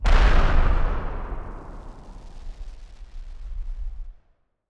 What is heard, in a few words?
Party Popper FX